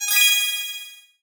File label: Pickup Gem Bells; SFX Kit; Pickups